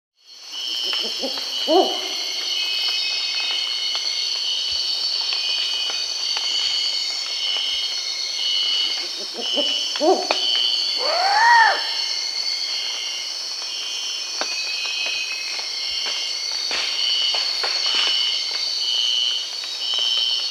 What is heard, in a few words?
Black-banded Owl (Ciccaba huhula), Peru; S. America